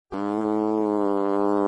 Slow Butt Burps Ringtone

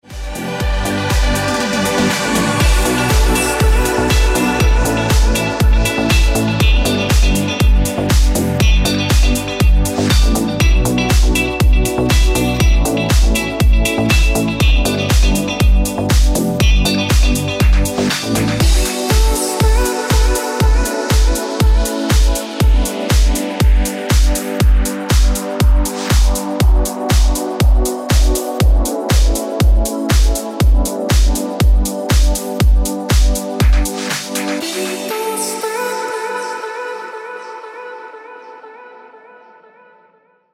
Ringtone

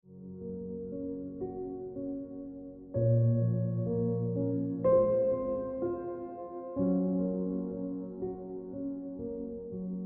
Ringtone Piano Music

